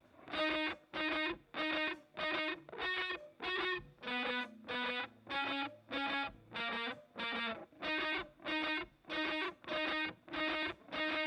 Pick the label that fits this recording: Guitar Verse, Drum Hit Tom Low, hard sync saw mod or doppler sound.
Guitar Verse